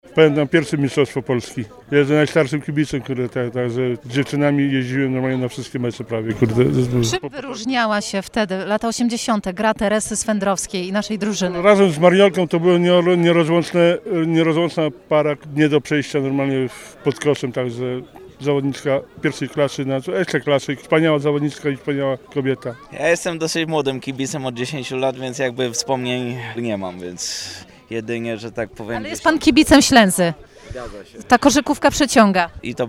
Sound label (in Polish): kibice koszykowka